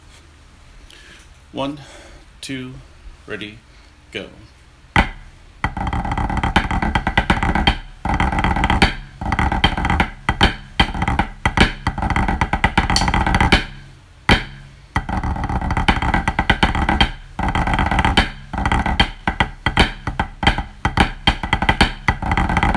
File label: Snare; Competition; Jim Thomson; KOMC Competition Scores